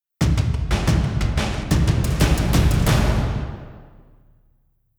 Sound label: Combat Out; Skellige